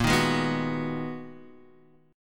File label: Ab Minor 7th Bb chord; Abm7/Bb; strummed; JGuitar